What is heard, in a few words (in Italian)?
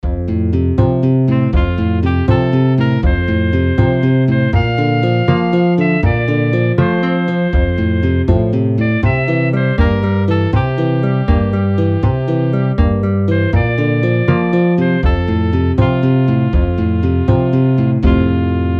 chitarra; canti madonna; Razioni di lassunta